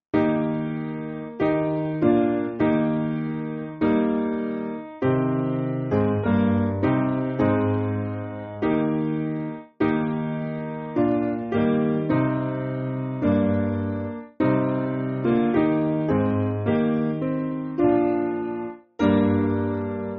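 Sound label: Piano, Backing Files for Churches; Wherefore O Father; Christe Fons Jugis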